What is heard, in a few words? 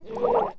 Drowning, Humans; zps; HM Water